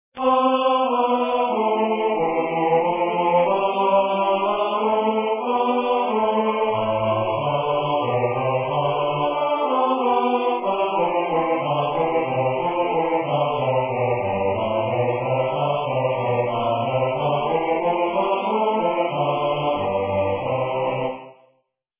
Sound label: bass